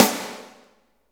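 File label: SNARE PICCOLO; Roland L-CDX-01; Snares 2; Sn Modules 2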